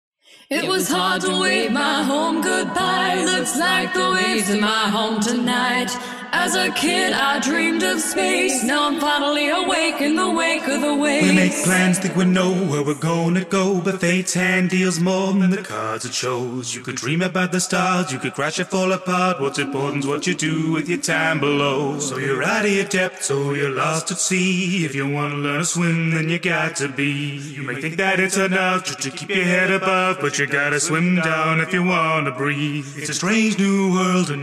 A Cappella; No Waves Like Home

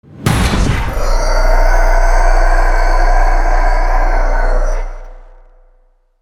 attack sound

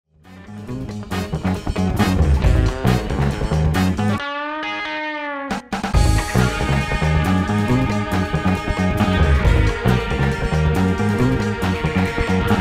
Brass Breakout

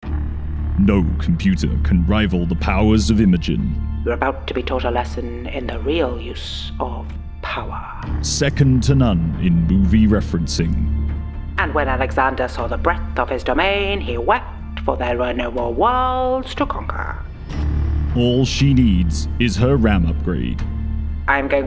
Teaser Action Suspense

